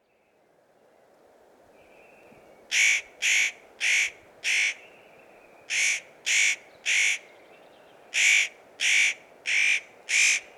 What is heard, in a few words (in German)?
Tannenhaeher; Immenstaedter Horn OA; Johannes Honold